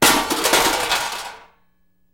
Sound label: Metal Debris Impact Settle